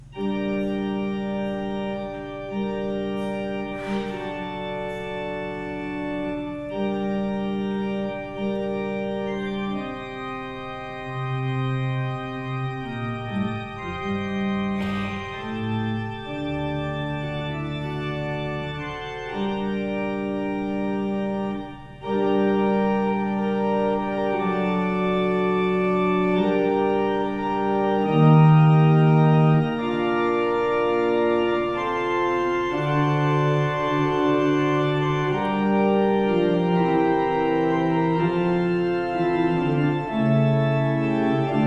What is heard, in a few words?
Organ interlude